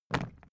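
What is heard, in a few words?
briefcase plastic pick up hard, Büroarbeit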